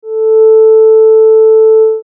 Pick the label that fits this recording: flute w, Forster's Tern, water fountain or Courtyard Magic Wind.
flute w